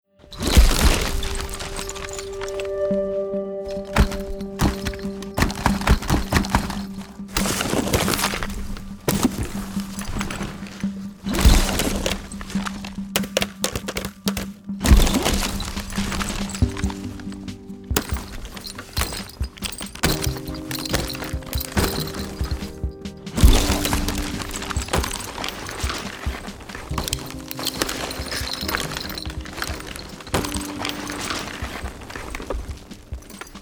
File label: Football Pads